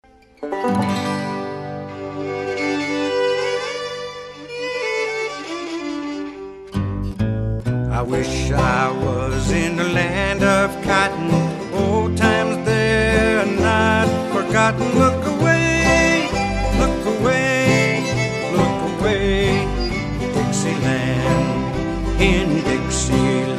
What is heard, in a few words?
Dixieland; southern